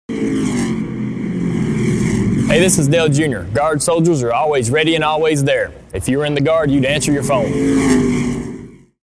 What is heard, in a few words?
racing ringtone